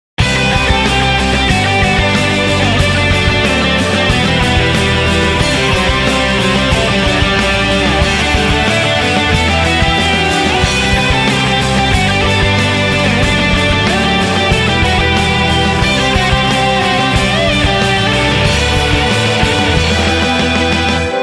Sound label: Guitar Solo